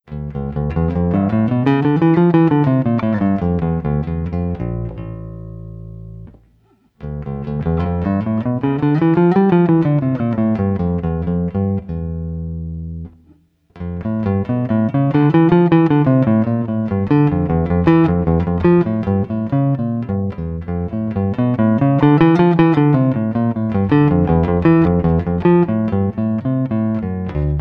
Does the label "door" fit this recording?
No